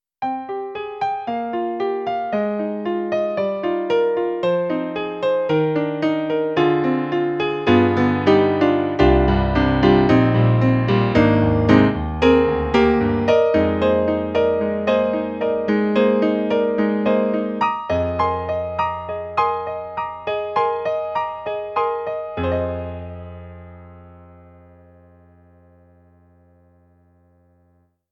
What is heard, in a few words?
Concert Grand; E-MU Sounds of the ZR Expansion ROM